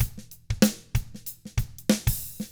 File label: 95 funk, drums; ILIO; Double Platinum Drums 1